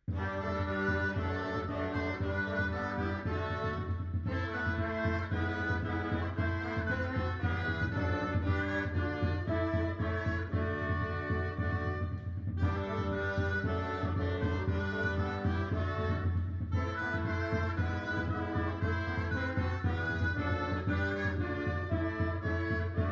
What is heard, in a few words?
allemande en tripla